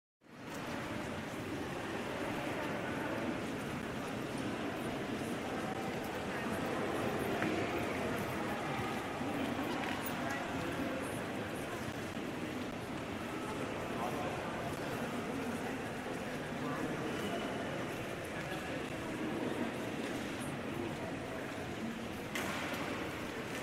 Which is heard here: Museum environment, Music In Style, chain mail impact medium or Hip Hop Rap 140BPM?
Museum environment